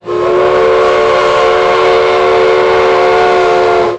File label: Steam Whistle; Whistles